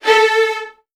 A2, Strings, VN 16TH; ILIO; Kirk Hunter

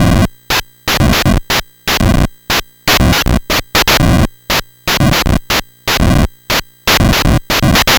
NES Noise Drum Loop, 8-Bit; Nintendo Entertainment System; Drums; Chiptune; Sonic Foundry (Sony Creative Software)

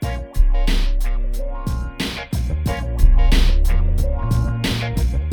91BPM A, Hip Hop; InVision Interactive; 40 Oz Phat Slammin

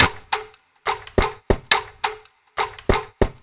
Addon Loop, Drum Loops 140bpm, Perc Loops

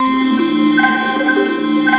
ATMO SYNTH; AKAI S6000 CD-ROM - Volume 1; SAMPLE WAVE MIXING 2; GALAXY

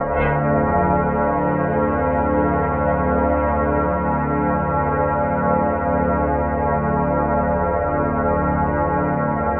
100 D Drone Dark FM; Zero-G - Future Wave; Drones

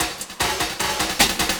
LOOP, 150 BPM, Drum & Bass

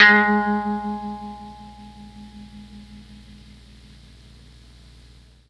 G#2 GUITAR, Mellotron MKII; Mike Pinder - Mellotron Archive CD

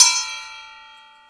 Bell One-Shot, cymbal; KJ Sawka; Drum n Bass & Beyond; Drum Tool Box

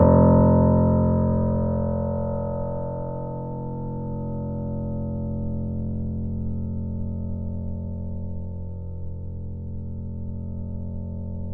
PP F 0, Grand Piano off mic; AKAI S6000 CD-ROM - Volume 2

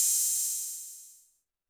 HiHat & Crash, Roland TD-20; HiHats

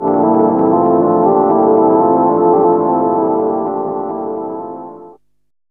DR. LOOP, TRANCE; X-Static Goldmine 3

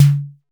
SD decay; Sonic-Core EDS16-V2

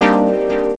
F, Drum & Bass Pads; Total Drum&Bass; 90 s Sample CDs

